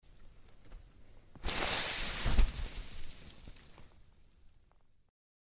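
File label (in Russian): ветку встряхнули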